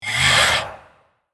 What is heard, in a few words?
Vampire pain hit scream reaction